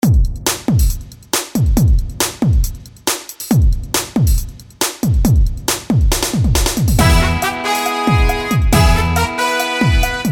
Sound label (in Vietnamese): Don xuan beat